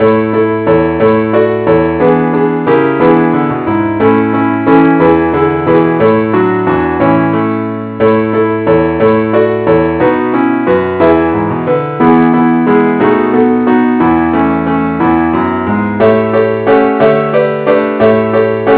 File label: keyboard only key A